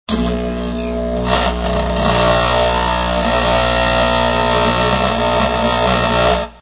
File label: buzz